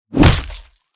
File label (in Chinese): 锤子砸通风管道